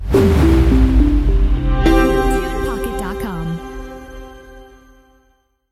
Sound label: Corporate Stinger; TunePocket